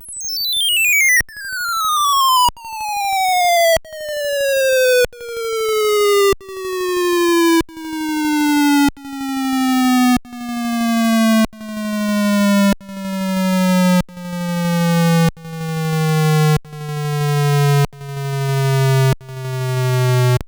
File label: FPGA Poke 8bit; pokey